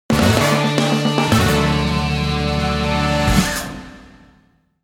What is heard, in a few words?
happy chord